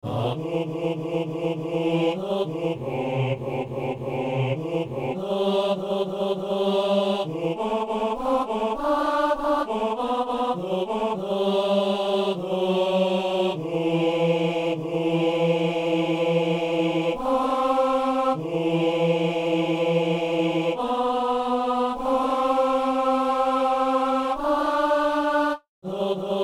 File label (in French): hommes; a 248 je crois en dieu